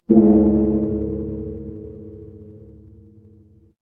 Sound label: instrument gong